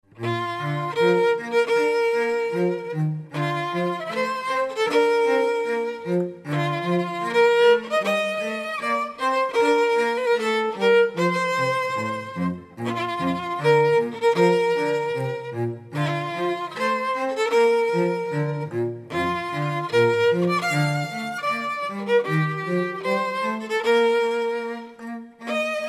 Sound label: Bridal Chorus Vln Cello; Bridal Chorus from “Lohengrin” (Wagner)